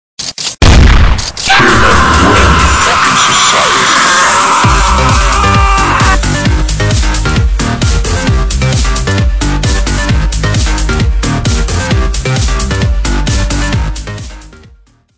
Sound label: human win; zombie plague